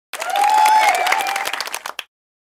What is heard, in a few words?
applause